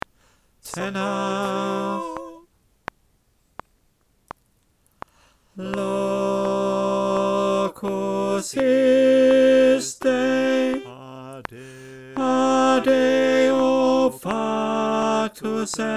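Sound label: tenor, choir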